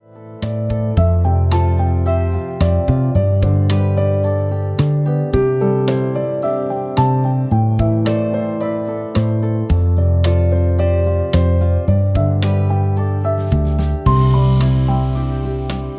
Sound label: Popular warm whistle; Popular warm whistle advertisement; MIUI12